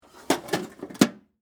Tool Box Lid Close, Industry, Tool, Box, Lid, Close, Metal, Case, Antique; Hand Tool Sound Effects; BlastWave